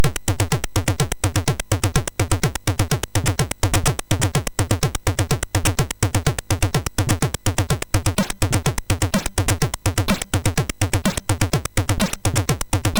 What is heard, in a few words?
Little Sound, sid MOS6581R4